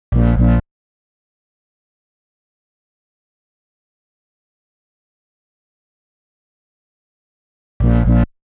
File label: 125 G Bass, Complex Electro Synths; Prime Loops; Explorations